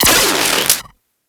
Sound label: Split Laser Beams Join; Warframe; Weapons; Corpus